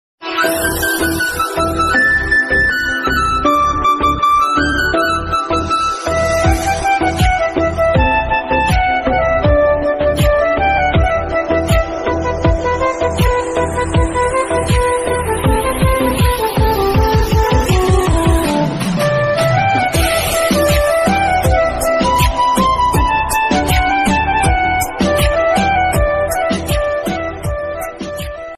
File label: happy new year ringtone